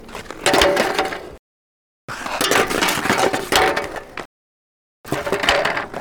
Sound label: Metal Shovel Drop Fall On Ice Clang Bang